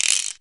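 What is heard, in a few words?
Cabasa, Roland S50 Percussion; Drum Samples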